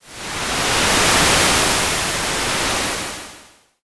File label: wave